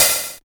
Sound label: HARSH OHH, LOFI HATS